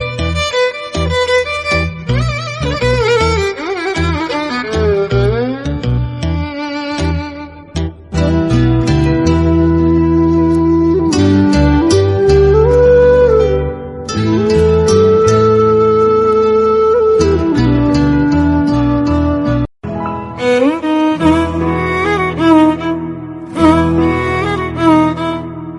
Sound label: malayalam violin ringtone